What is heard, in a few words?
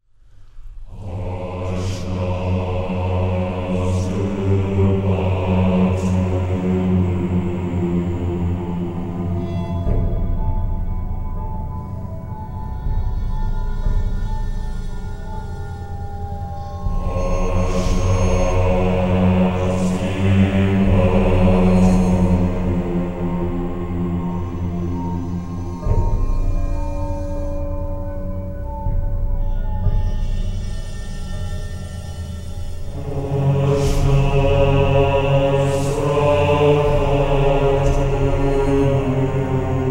one ring incantation; lotr music